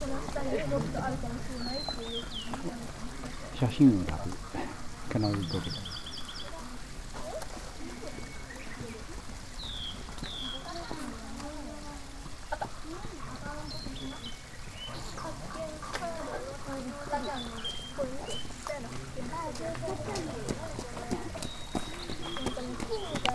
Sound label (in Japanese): ooruri; murorindou